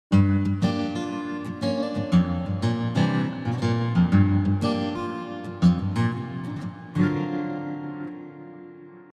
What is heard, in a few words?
Universal Spooky Less